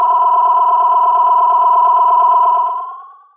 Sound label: Tape Delay Feedback; radiosonics